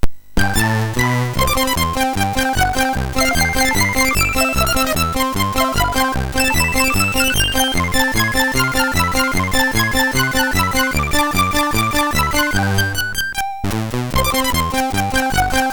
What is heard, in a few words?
sid, MOS6581R3; New Zealand Story; hvsc